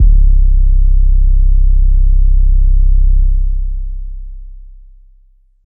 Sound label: 808s